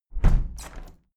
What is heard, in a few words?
window plastic squeak close heavy sequence